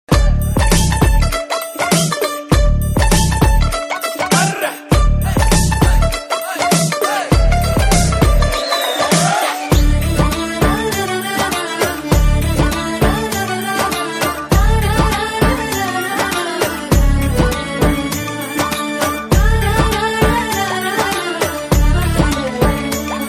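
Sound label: background music Telugu Ringtone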